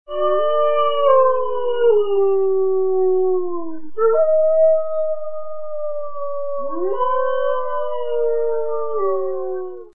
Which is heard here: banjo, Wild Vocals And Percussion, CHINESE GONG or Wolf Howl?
Wolf Howl